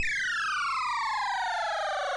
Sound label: fall; warrior